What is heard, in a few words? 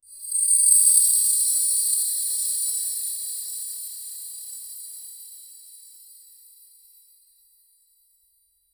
soft bells airy chime